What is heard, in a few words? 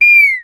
bird call